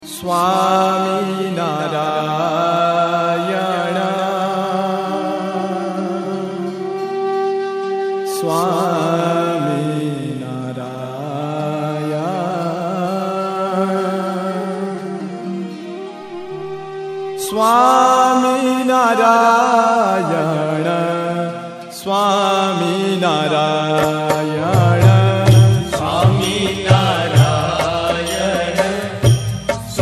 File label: Dhun